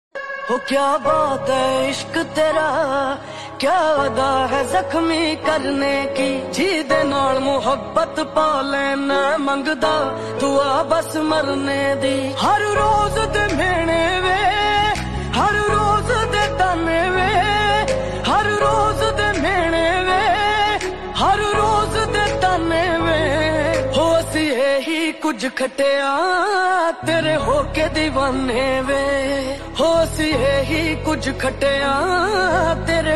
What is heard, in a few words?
Ringtone